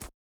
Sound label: wolf footstep concrete hard; Rust